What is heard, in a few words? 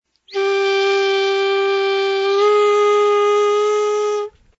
bendup